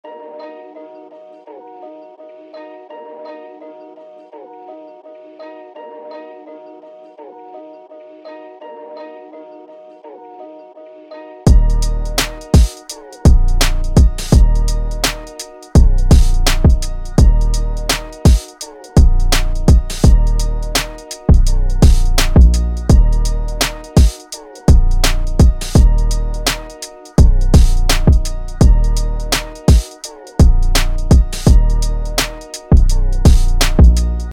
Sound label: juss a beat